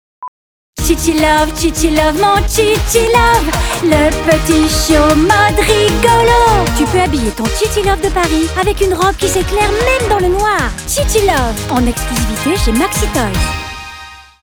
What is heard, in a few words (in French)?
Pub TV enfant chant; Maxitoys; Chichilove